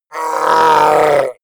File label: orc call pain